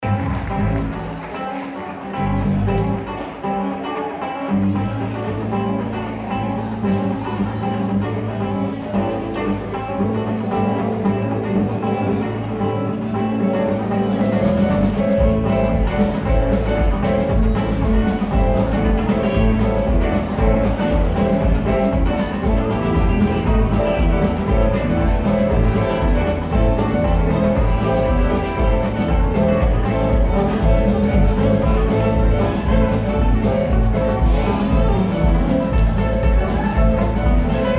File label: audience mix